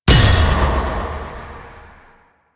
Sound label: Pre Death End